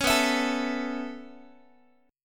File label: B 7th Suspended 2nd Suspended 4th B down Guitar; B7sus2sus4; strummed; JGuitar